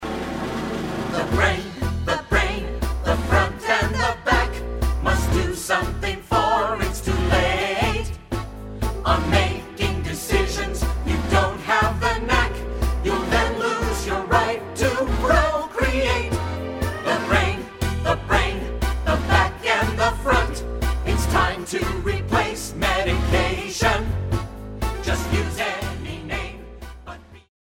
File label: tango